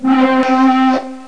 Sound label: Tekno table move; meetmediaband